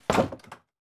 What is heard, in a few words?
wooden big